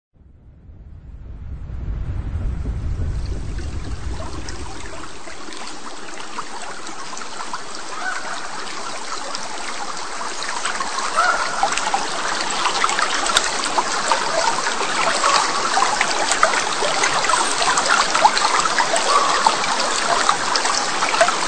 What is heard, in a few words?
Rainy Creek Old Growth; Gordon Hempton